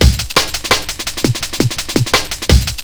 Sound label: rollin jungle, drum and bass loops